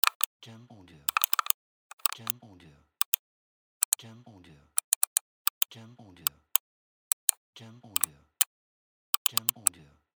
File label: Computer Work